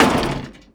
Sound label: roadsign large impact